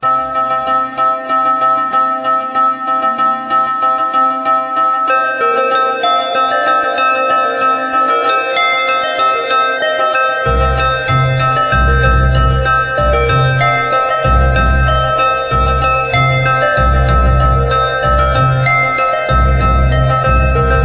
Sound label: Chime Eternity